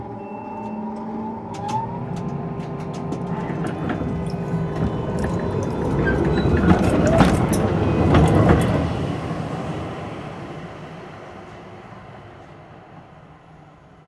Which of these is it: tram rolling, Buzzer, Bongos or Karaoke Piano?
tram rolling